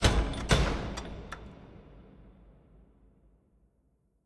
knock

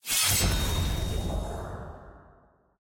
sfx mini wings master; challenges

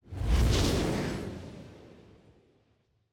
game select trans to lobby; parties

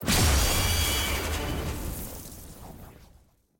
ui gameplay ult ready

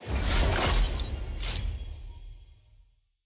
loot reveal permanent rarity epic

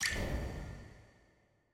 ui main click

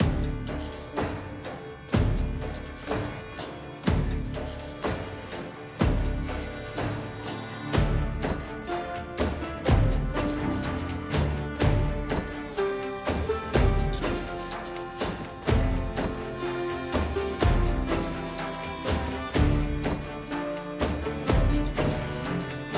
music; bilgewater; allrandom; butchers bridge

